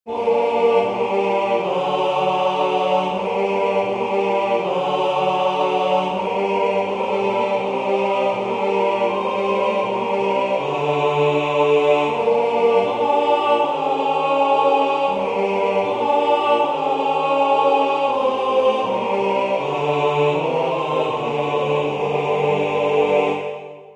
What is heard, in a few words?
Tenor; E.Grieg; Dirge the death of ase